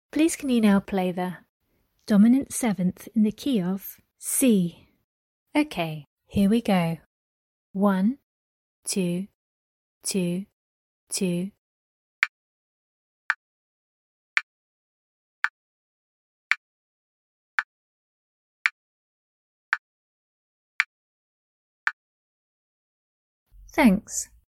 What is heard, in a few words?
dom 7th in the key of C